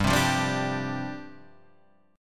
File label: G 7th Suspended 4th G; G7sus4 Chord {3 3 3 0 3 3} strummed